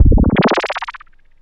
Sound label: modular 6, Poly-6